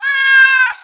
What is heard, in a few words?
cat dead